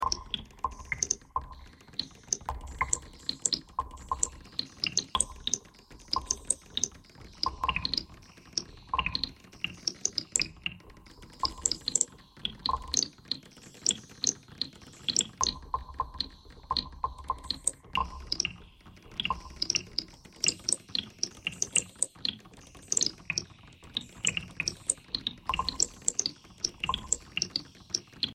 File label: bagpipe thread pentatonic